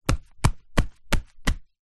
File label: sport goalkeeper gloves x2 clap together x5